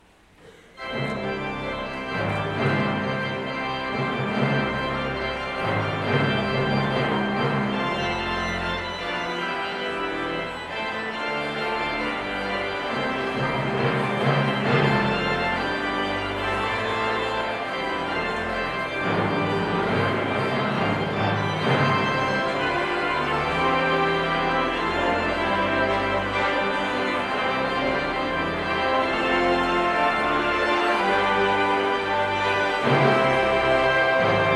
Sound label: Postlude